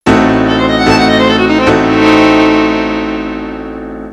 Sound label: Heavy Shock